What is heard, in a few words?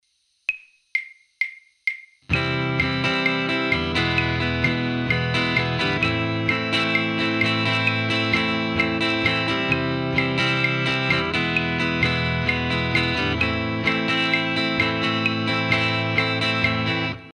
practice chord